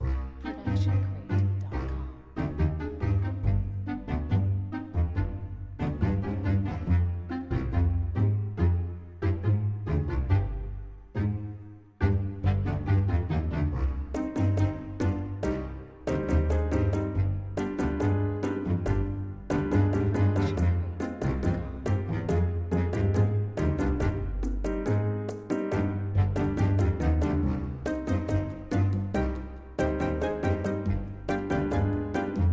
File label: Tango Fun; SoundsCrate